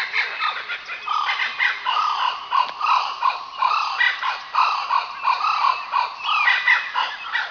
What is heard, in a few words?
jungle; World Racing 2